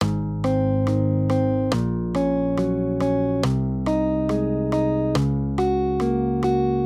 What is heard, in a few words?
maj triads